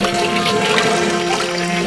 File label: WET, WATER; AKAI S6000 CD-ROM - Volume 1